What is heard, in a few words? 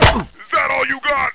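bullet hit flesh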